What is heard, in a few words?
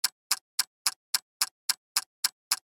clock tick; alarm